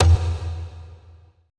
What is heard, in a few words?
E LOG SLP, ETH DRUMS